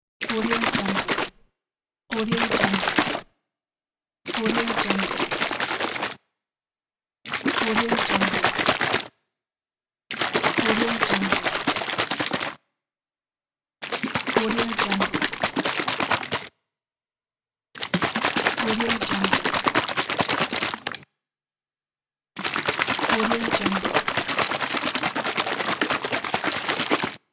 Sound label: shake bottle with water